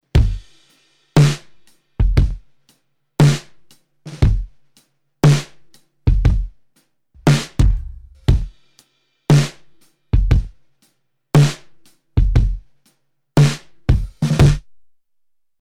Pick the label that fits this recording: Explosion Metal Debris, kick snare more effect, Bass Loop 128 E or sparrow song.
kick snare more effect